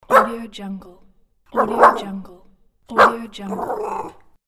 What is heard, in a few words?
dog barking; medusamusiclab